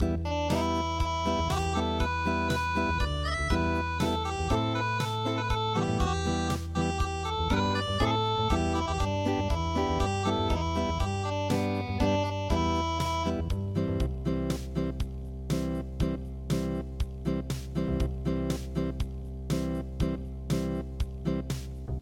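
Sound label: kusatsubushi unplugged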